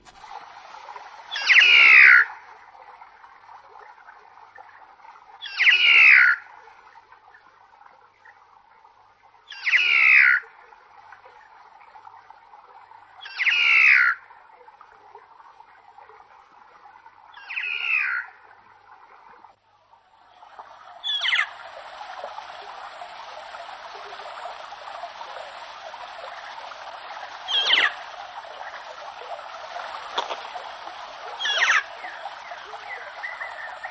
Black Faced Cuckoo Shrike

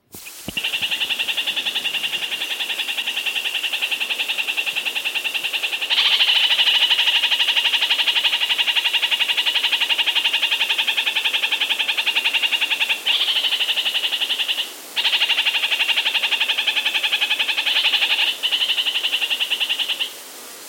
Red Crowned Parakeet

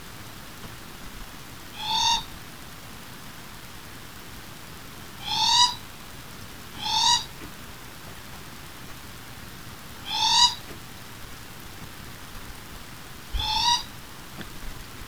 Morepork cree call

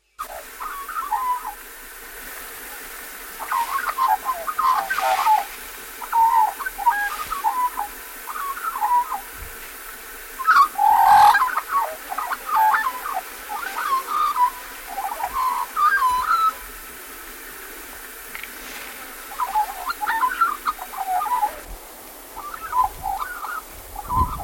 Australian Magpie (Black-Backed)

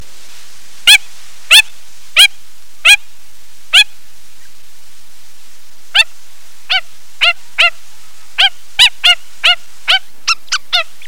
stilt

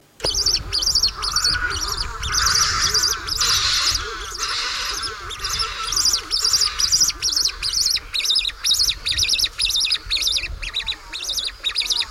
Buller's Shearwater

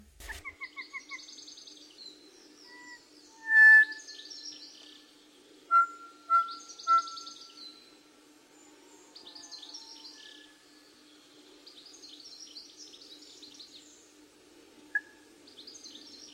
Kokako (North Island)

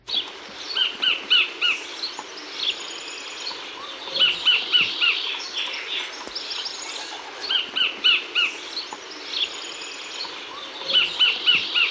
New Zealand Kingfisher

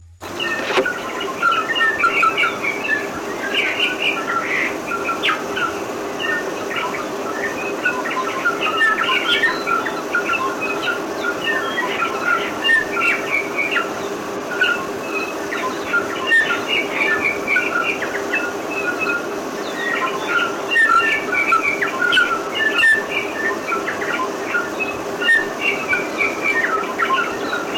Bellbird (Three Kings)

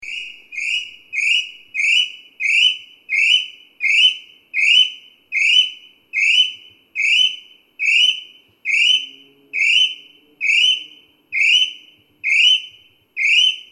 Upper Tieke, M

